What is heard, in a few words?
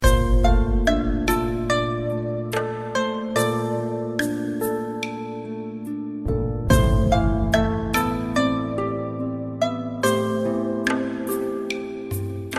menu music